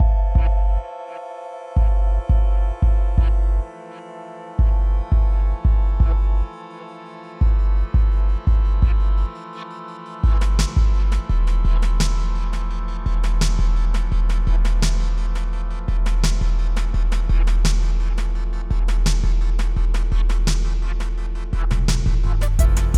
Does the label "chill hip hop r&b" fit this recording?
Yes